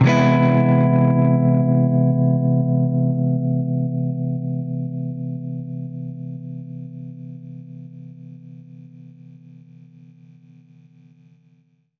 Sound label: Baritone Chord Amin9[maj7], Guitar Chords